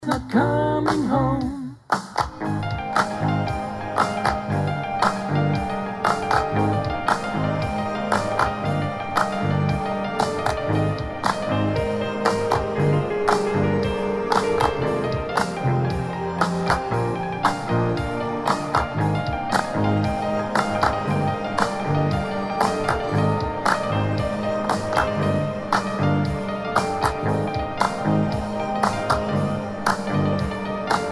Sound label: prayer piano wash